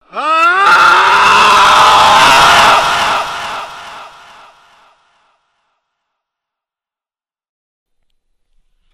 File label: scream